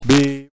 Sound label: bebop